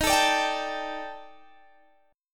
Eb Major 7th Flat 5th Eb Guitar Open G

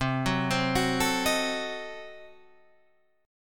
C Diminished 7th C down Guitar Open G

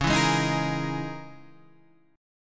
Db Minor 6th Add 9th Db down Guitar Standard; Dbm6add9 strummed; JGuitar

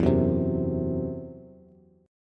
E Suspended 2nd Sharp 5th, Bass; Esus2#5 strummed; x,7,4,5

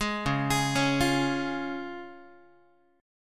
C sharp Major, Guitar; 11,9,11,11,9,x; arpeggiated; JGuitar

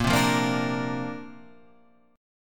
C 7th Suspended 2nd Suspended 4th A sharp

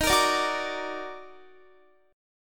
D sharp Minor Major 7th, Guitar; strummed

